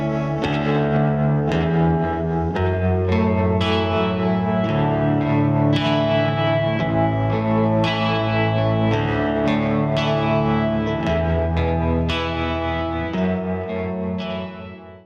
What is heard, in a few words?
clean guitars acc